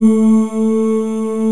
A3 MALE

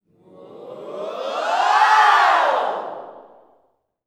SWHOOPS, Vocal Planet Gospel yell shout; ILIO

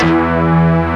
MALE CHOIR

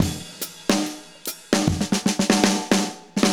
Untidy, Drum Loops Jazzy; Zero-G Smoke

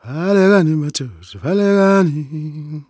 Vocal Chirp, African Voices; Quazibeat; African Secret

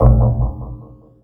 Stretchy Spring; Zero-G; Soundclash Nu Dance Electro; Acidized Wavs; FX Atmospheres

